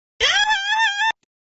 funny ass laugh

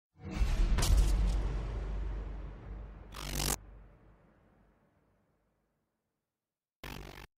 notification

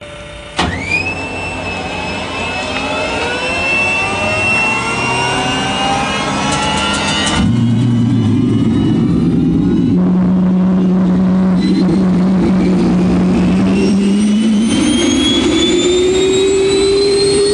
jet engine startup

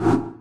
loading; starcraft

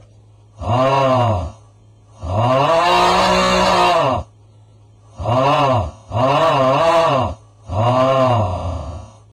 aaaaaahahaha

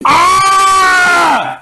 aaaaargh, scream

